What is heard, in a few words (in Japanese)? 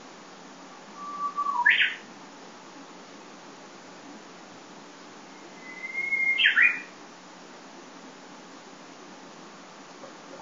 uguisu